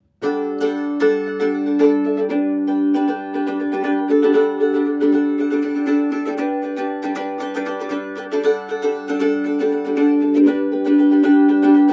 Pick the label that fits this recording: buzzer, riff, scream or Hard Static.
riff